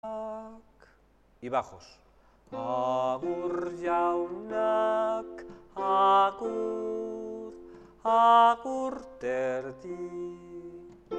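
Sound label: bass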